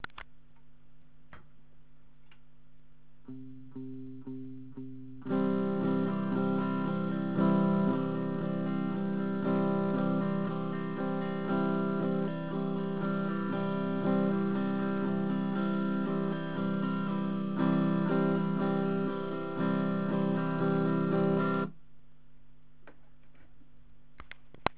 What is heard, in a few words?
strumming